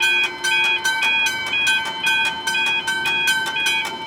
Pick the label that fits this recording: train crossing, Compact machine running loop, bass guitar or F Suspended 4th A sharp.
train crossing